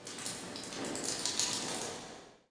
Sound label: PIPES, pipes; zones